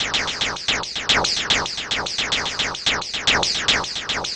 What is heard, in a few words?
Techno Synth